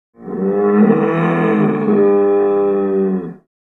Cattle, Cow Bellow. Single Large, Ominous Bellow. Medium Perspective.